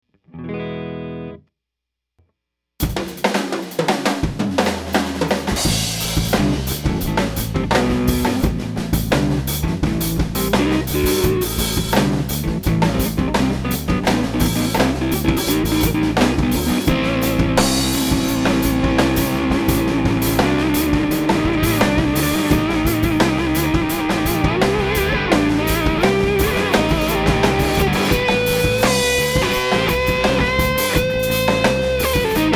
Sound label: Groove